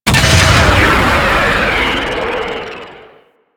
powerplant jettison drop; Ambience; Warframe; Corpus Railjack; Tether Power Plant

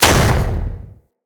Eruption Cannon Slam; Sargas Ruk

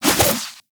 Add Mineral To Meal Throw Whoosh; Alchemy Lab; Warframe; Hub; Liset

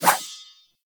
Rapier Whoosh; Warframe; Weapons; Swords

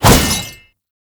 Shield And Sword Whoosh; Mortifor

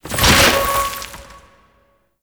Protective Shell Charge; Citrine

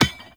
Orb Cannon Drop Impact

